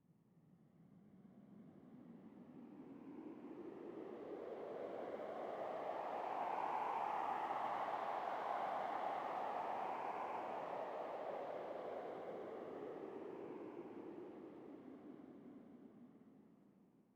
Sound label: sting wind gust; Rust